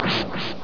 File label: ITEM FND